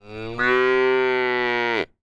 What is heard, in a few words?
cow cry